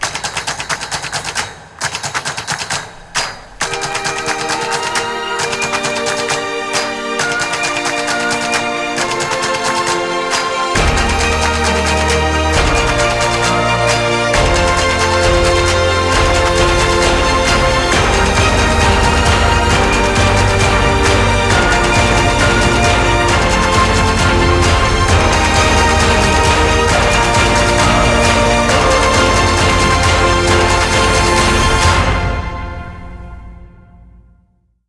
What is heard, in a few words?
Ringtone